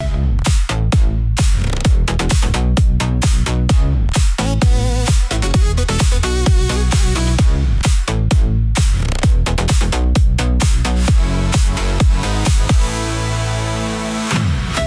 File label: loop